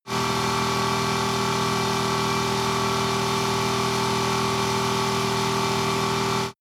motorcycle engine medium high constant rpm ascending; motorraeder; verkehr transport